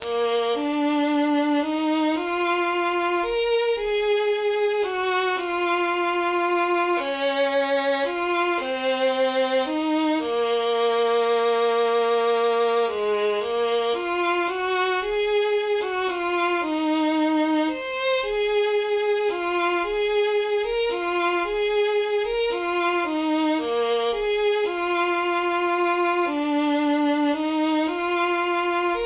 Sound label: violin